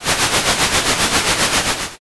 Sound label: comm noise